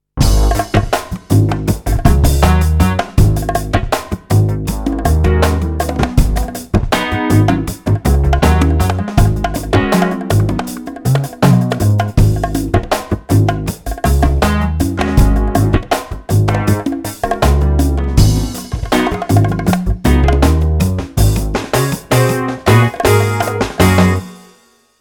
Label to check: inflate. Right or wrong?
Wrong